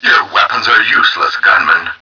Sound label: boss hurt